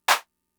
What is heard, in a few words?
Clap; Fricke MFB-522